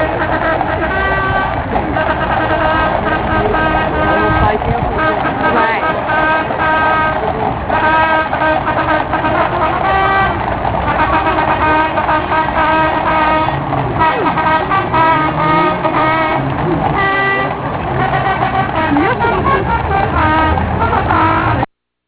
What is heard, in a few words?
trumpet; London